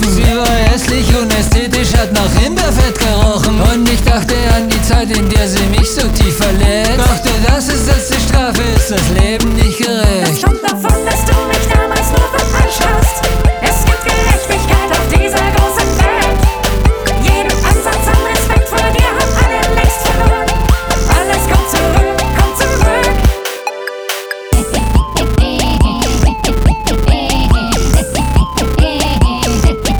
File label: Electro Pop; Orlando inkasso